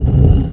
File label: Button Move